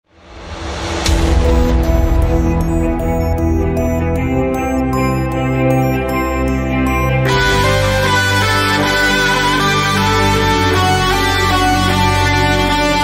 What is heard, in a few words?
hard kick; extended mix